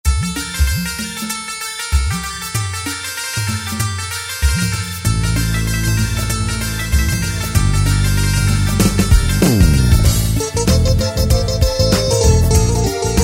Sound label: Indi Pop, Yamaha YPT-400 styles